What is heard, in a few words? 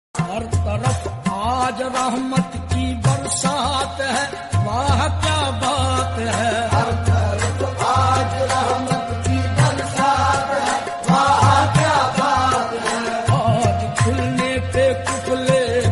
qawal, devotional, hindi